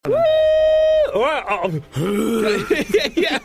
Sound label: heugh; game grumps